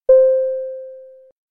Elevator Bing

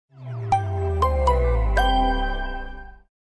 boot noise; S6

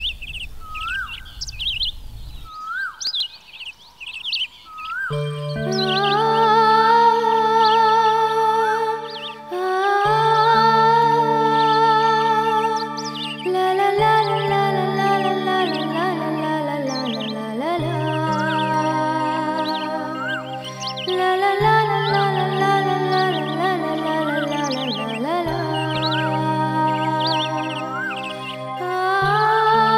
Best love tune

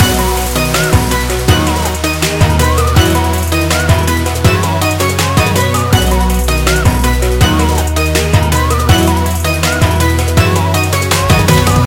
Pizzeria Ringtone

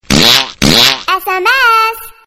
Fart Sms

